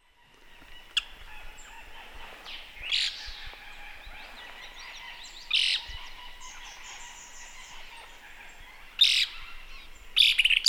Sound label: Loggerhead Kingbird